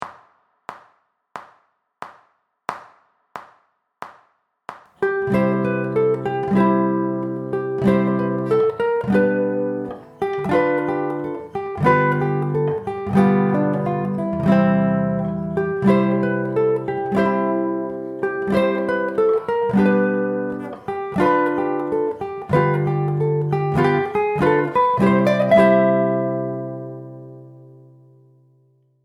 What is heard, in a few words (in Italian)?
melodia e accordi